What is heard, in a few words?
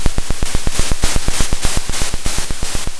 Sound of a drum roll